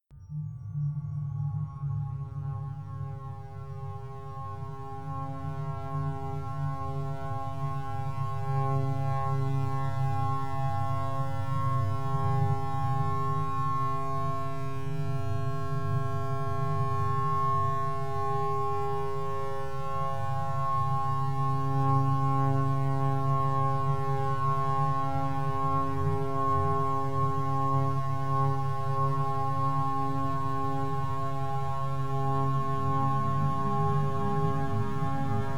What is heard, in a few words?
Drone